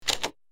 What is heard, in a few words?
key lock insertion mechanism; lock insertion mechanism sfx hd; EvilCocoGame1